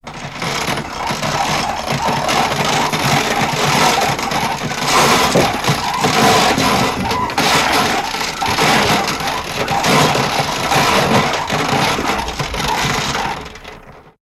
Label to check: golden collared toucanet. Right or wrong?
Wrong